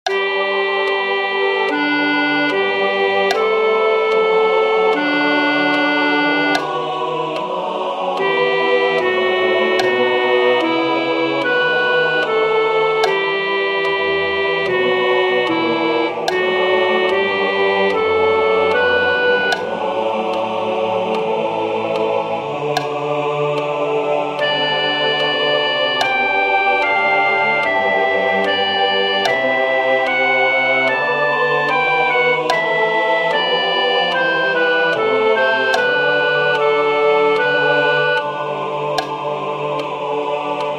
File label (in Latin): Adeste fideles